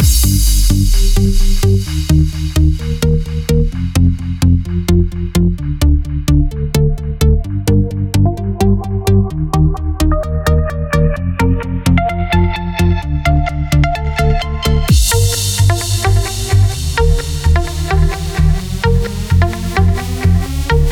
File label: Progressive